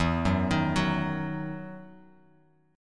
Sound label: E Major 7th Suspended 2nd E down Bass Standard; EM7sus2 arpeggiated